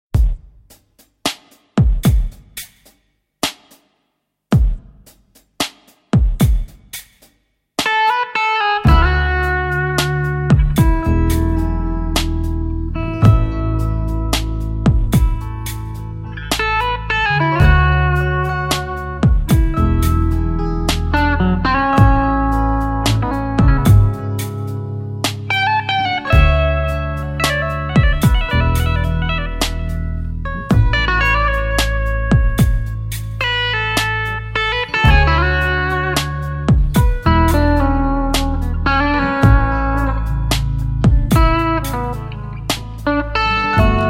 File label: guitar meditation